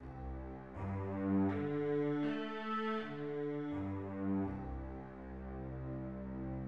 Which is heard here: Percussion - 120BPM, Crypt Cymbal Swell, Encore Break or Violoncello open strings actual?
Violoncello open strings actual